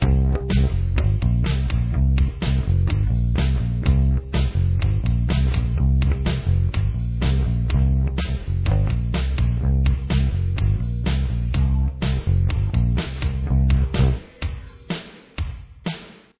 bass intro

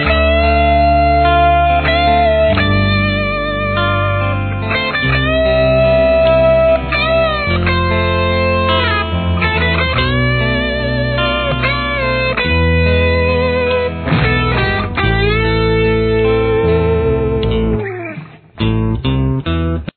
intro solo mix

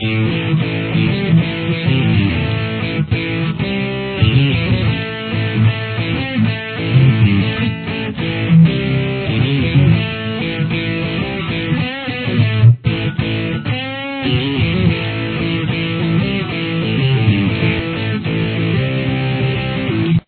intro rhythm, guitar

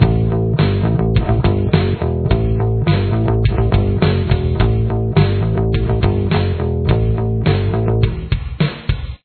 bass

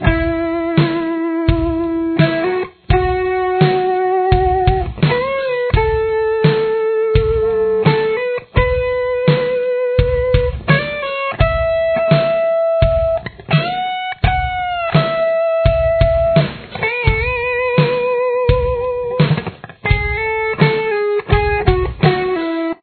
bridge lead

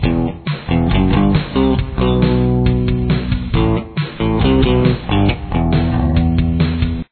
bass; I doubled the bass line with a guitar

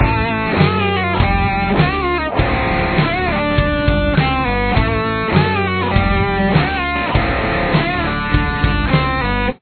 solo

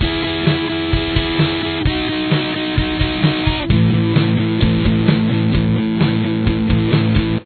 chorus guitar; Guitar 1

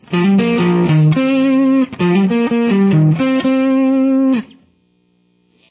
solo

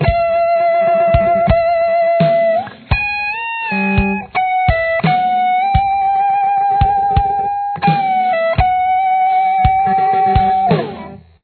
lead, guitar

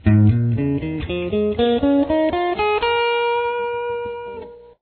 a scale pattern example, guitar; the A scale pattern in the key of C#/Db; Transposing The A Scale Shape

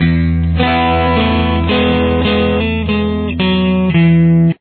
verse detail, guitar

